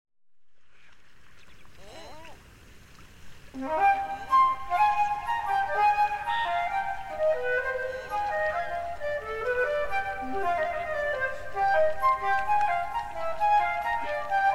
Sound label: Suite in C Minor, Gavottes; Suite No. 5; BWV 1011